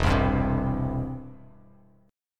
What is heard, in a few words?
E Minor Sharp 5th E down Bass Standard; Em#5 strummed; JGuitar